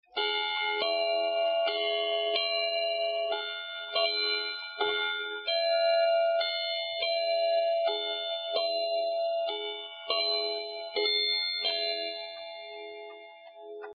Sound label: Bim Bam